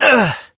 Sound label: Generic Short Pain, Operator Voice; Operator Mission